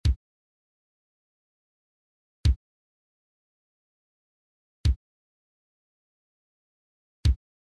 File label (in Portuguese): Toque - Bumbo; fanfarra